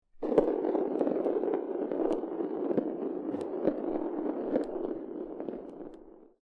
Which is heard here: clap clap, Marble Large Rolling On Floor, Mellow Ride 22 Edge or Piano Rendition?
Marble Large Rolling On Floor